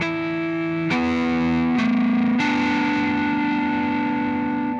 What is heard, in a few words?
Harmonic Riff, Guitars